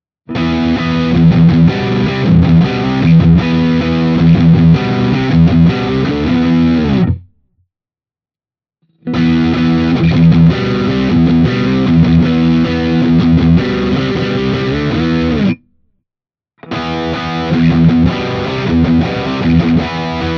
Schecter Exotic Ziricote rhythm Riff A all positions